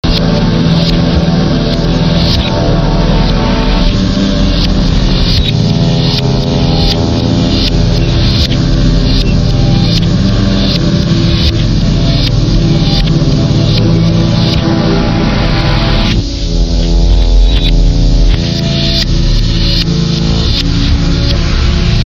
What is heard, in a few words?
Blues